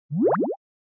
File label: Motion Pop